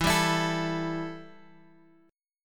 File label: E Minor Double Flat 5th E Guitar Open G